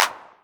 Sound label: 909 Clap, Novation Drumstation